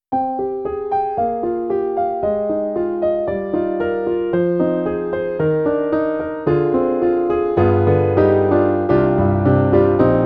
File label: E-MU Holy Grail Expansion ROM, Smooth